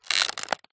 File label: foley plastic stress pressure; zapsplat